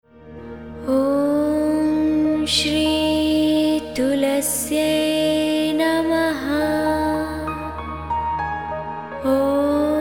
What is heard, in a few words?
Tilak Mantra; Tulsi Jayanti; Tulsi Poojan Tracks